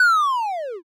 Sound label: slowmo